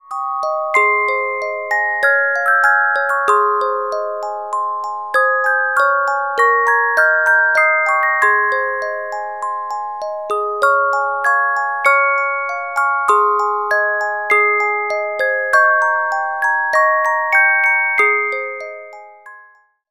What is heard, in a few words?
Carillon Music Box Melodies